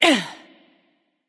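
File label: grunt attack, female; smite; voicepack; hera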